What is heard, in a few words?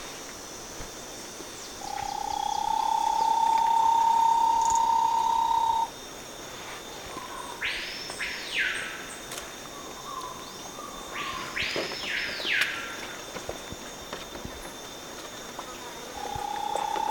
Bahia-tapaculo, پرنده تپلک باهیا; صدای پرنده تپلک باهیا